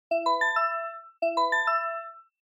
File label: News Alert